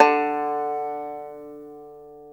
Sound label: banjo D2B; InVision Interactive; Lightware VOL-1; Instruments & Percussions; BANJO ONE